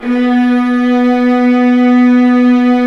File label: STR, Vas Bright; Roland L-CD702; Vlas Sect1-2